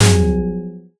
horror suspense hit

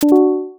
notification pluck

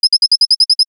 insect chirp

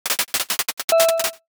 mini applause blocks

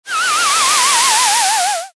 horror whisper noise

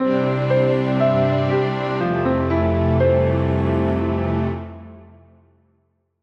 jingle soft logo

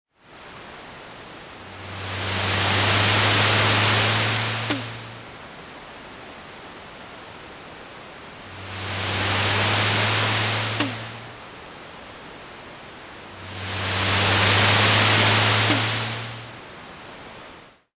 wind window soft

